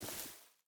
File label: footstep rubber boots grass walk; Rust